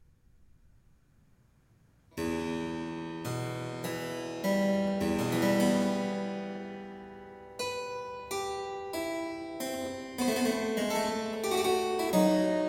Suite No 8 in F minor for harpsichord Prelude; Handel

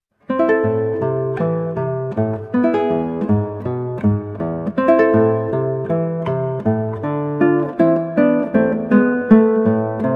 Sonata C 6 Allegro, Guitar; Cimarosa; Domenico Cimarosa

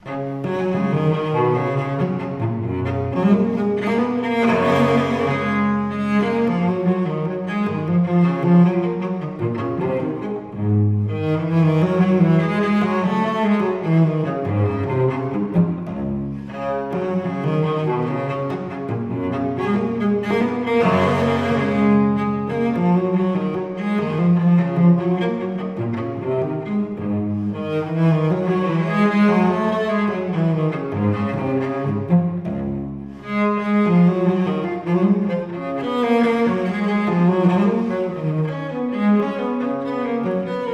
Cello Suite no. 1 in G Major Gigue; Magnatune Compilation; Phoebe Carrai; JS Bach; BWV 1007

